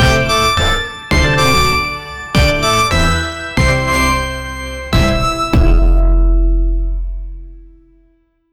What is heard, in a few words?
countdown for intro video